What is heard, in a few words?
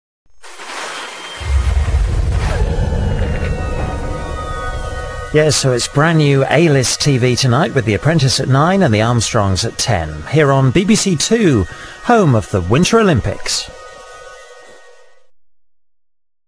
jingle winter; bbc